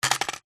industrial hand tool plastic cheap down on concrete surface